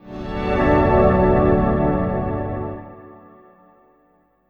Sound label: PAD, Drum & Bass, 150 BPM; Ueberschall; Drum & Bass Resonance